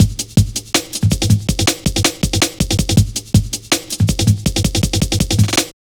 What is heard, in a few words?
Drum Loops, Jungle